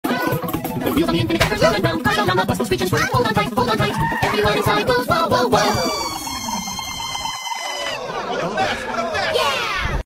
bus scream

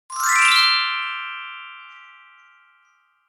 glisten

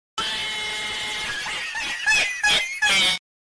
cat meme aaaa

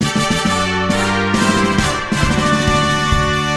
fanfare

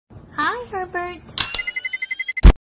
explodes; hi herbert; herbert the pervert meme

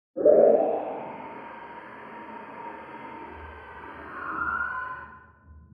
muffled screech; voidling

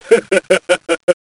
chuckle; dio

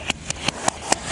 reverse time

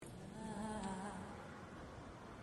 ahhhh

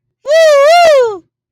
wooohooo

